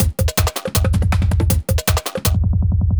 jungle juice 160

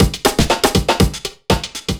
chemical 120, loops, 120bpm

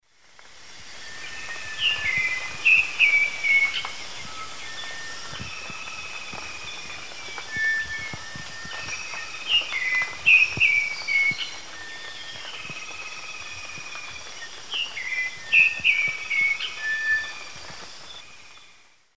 Habia rubica, song; S. America; Eastern Lowlands

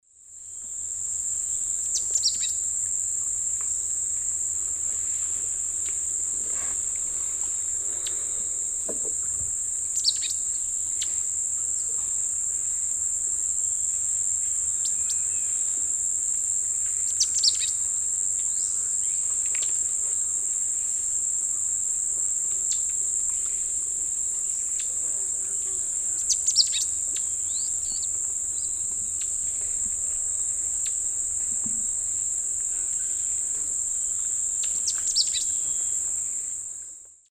Euphonia chrysopasta, calls; S. America; Eastern Lowlands

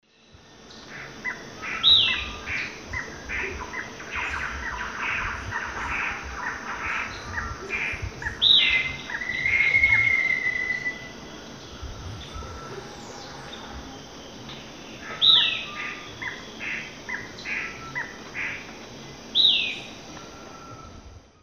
Lathrotriccus euleri, song; S. America; Eastern Lowlands

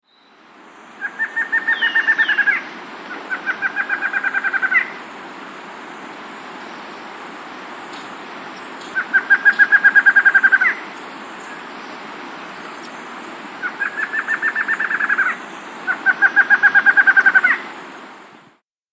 Lined Antshrike, S. America

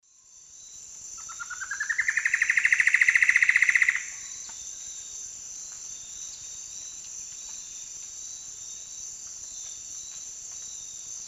Myrmeciza hyperythra, song; S. America; Eastern Lowlands

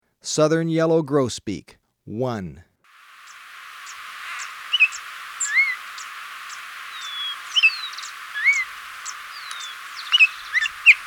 Southern Yellow Grosbeak, Birds of Ecuador; S. America

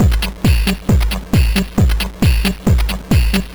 Drums Techno

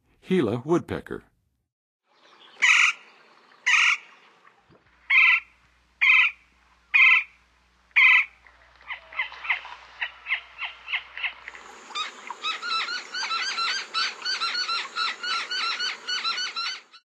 Gila Woodpecker, Songs of Birds; North America; Western USA; Western USA Songbirds

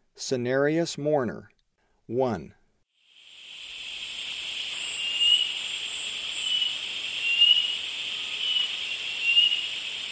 Cinereous Mourner; S. America; Eastern Lowlands

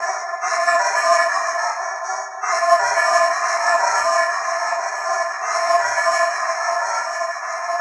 Hoarse Harp; Electronica; Tonal

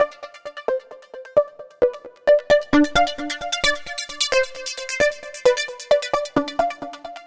Lead Synth

